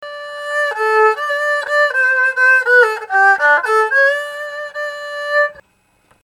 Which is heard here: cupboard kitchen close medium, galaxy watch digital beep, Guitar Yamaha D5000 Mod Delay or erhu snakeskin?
erhu snakeskin